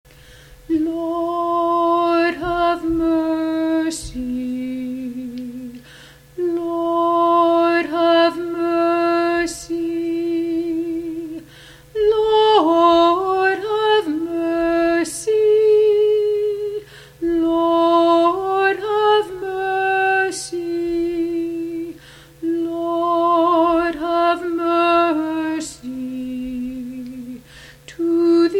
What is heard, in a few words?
great litany alto